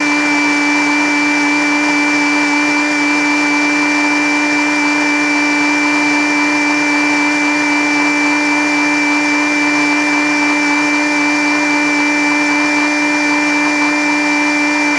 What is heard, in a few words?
Blender Motor Loop; appliances